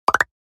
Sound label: click